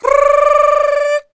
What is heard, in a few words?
FEM PERC, Vocal Jazz FX